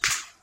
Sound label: bat